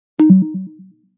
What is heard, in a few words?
Bleep; Beeps